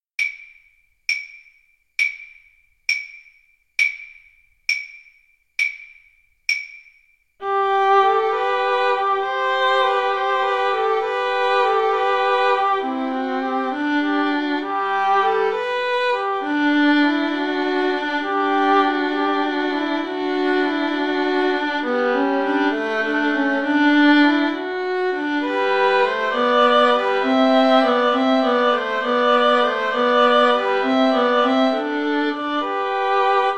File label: pastorale VLNS